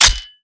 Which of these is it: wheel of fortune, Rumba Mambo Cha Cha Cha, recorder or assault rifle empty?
assault rifle empty